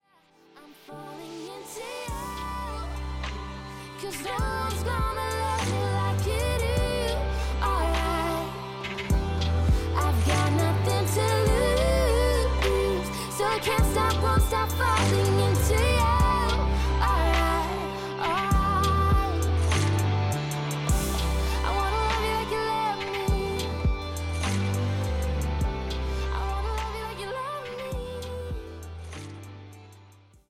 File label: (acoustic)